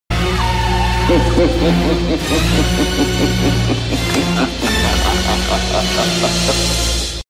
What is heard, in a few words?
Dracula Laugh